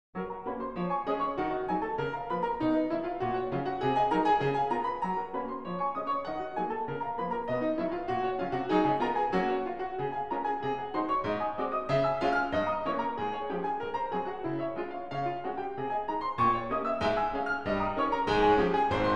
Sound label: Etudes in G flat major, Piano; Chopin